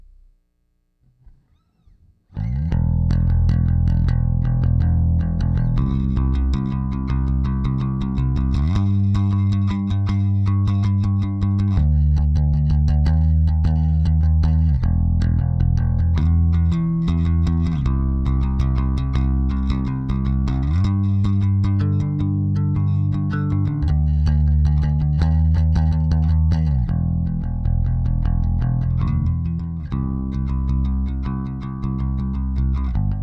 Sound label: Bass